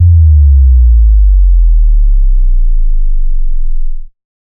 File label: Sub Drop